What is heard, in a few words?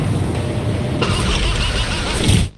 jumpscare; prototype